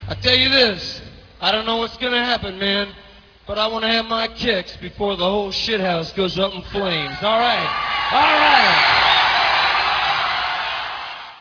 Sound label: flames